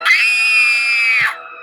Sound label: scream